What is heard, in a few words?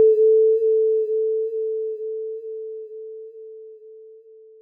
Tone Wobble